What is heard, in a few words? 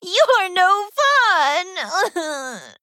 Female Pandaren Death